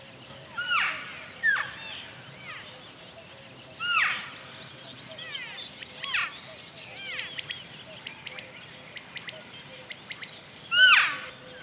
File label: Cervus elaphus sibiricus female oral; Females of the Siberian wapiti (C.e. sibiricus) call for their young with loud oral contact calls